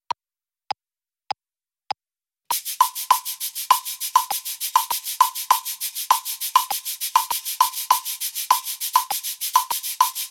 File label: Cabasa Foot pedal; shakers